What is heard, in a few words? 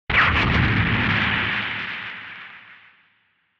Retro Anime Explosion Low Short